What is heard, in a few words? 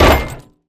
Hit Closed; Thanatos